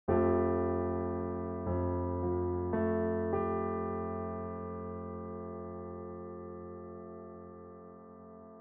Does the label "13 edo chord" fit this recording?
Yes